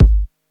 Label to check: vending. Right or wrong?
Wrong